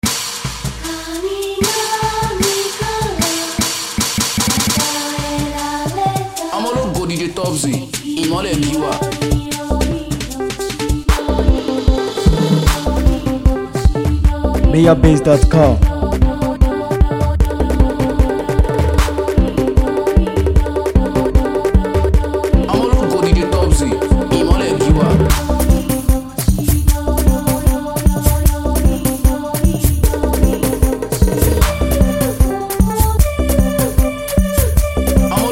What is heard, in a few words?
Mara Drum Beat